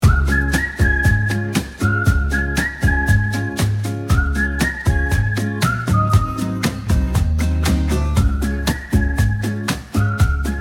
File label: music